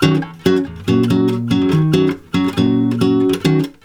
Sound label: D# MIN, Brazil Bossa; USB Soundscan; AKAI